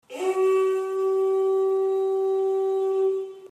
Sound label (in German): Wasserbuffelhorn Roh